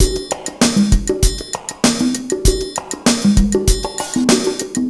drum; FUNKACID3

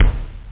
Bass Drum; Drum Kit 5

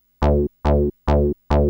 Bass; Techno Music Maker

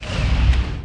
Switch Guns; SWARM

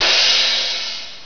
cymbal

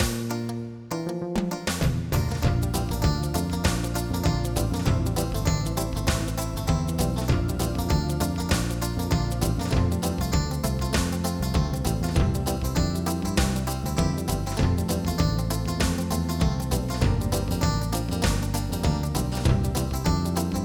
reggae

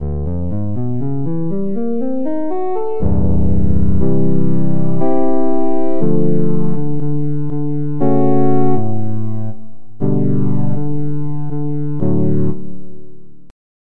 Horns; Onj's Soundfont

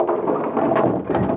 door; DAoC

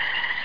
cricket; dosgames; roc

